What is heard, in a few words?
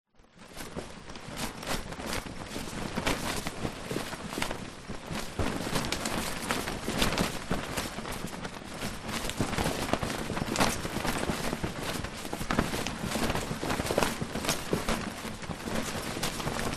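foley tent blow around in wind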